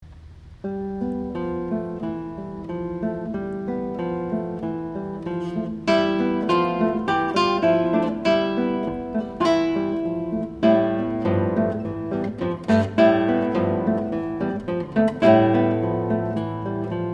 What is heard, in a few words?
Classical Guitar